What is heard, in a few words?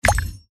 btn Click; 1casino-book-of-ra-1